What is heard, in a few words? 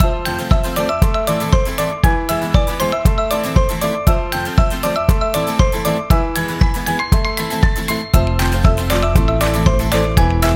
Quirky Funny